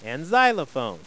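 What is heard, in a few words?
percussion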